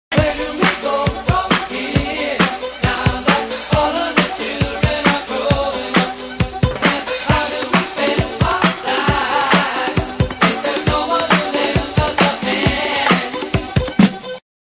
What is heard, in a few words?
Dance music disco